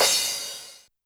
Crash Hard; YAMAHA RM1X

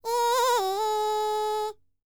BULGARIAN, World Voices, BALKAN

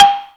ZYLIMBA; Greytsounds VOL-1

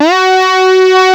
SAW GLIDE C3; Kid Nepro; Elite

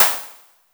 ANA WN PERC, ANALOG PERC; Zero-G Ambient 1

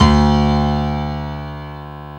PNO, Electrics, Grands; Club-50 - Foundations

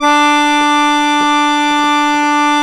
ACCORDION D4; USB Soundscan vol.63; Balkanish Traditions; AKAI

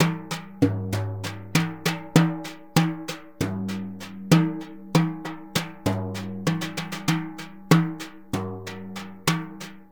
Synclavier Percussion, World & Orchestral

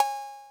COWBELL; E-MU Producer Series Vol. 8 – Platinum Phatt

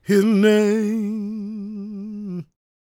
gospel male, vocal; ILIO; Vocal Planet

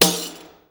TAMBOURI.HIT; Best Service; World Colours

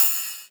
Rides, Cymbals

